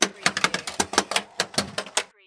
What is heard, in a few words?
Pinball sound, multiple bumpers or switches